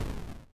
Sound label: Explosion; Eleven: Stranger Things The Game